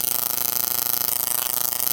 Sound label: Radar Flying Sound; Jolly Battle